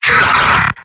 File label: sniper pain severe; vo; tf2; mvm